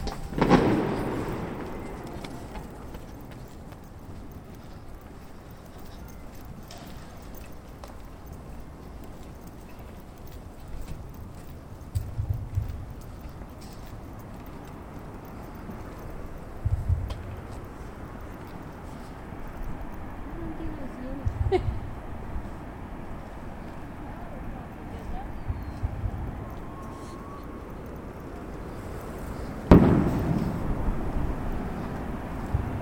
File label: ocean beach new year's eve